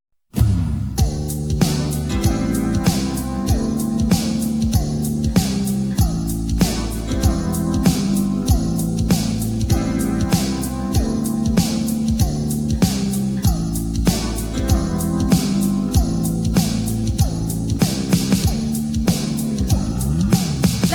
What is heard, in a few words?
Jingle